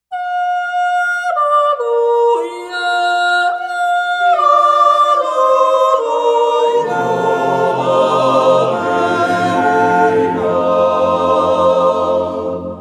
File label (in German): Jutz